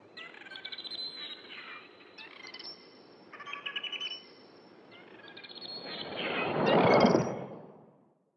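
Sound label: dolphin; sea asshole; Blind Drive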